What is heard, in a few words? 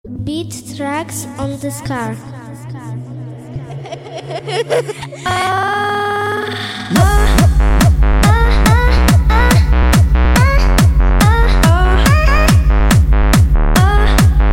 Beatbox